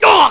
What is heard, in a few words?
Hurt; Byrne getting hurt badly (also used when pulled off a perch)